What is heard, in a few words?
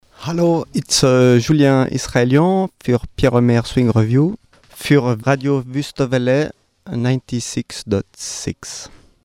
swing; revue